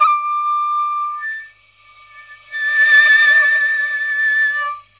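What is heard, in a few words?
China flute; Spectrasonics; CHINAFLT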